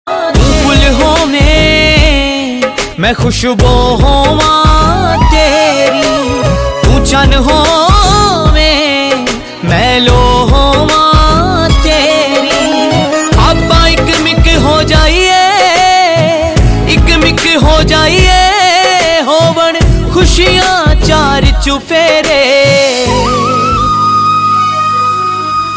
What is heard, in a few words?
Punjabi Sad Tones